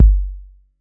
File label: BD 808